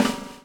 BUBL, BUBBLE; Spectrasonics - Backbeat

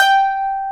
MANDOLIN; AKAI S-Series CD-ROM Sound Library VOL-7

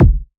BD, Waldorf Blofeld

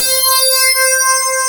pluck C5, FM pluck

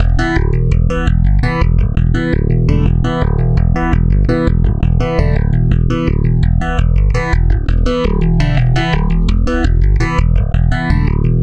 Stick Loop, Stick Bass

